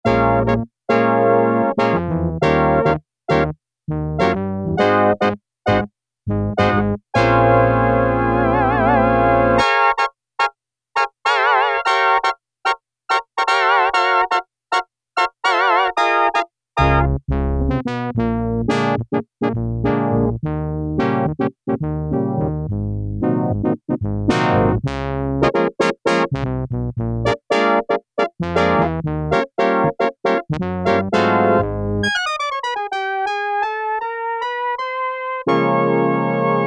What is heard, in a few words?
BRASS, Yamaha DX7